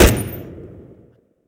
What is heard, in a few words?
Browning 303 RH Outer End; Weapons; DCS